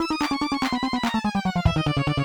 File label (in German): Hummel Flug